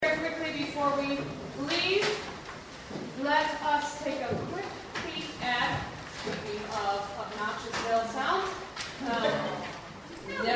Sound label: christmas jazz